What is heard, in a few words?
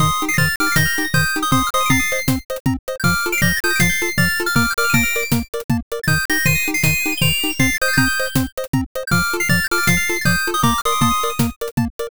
bgm bright